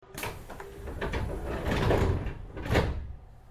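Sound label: train door close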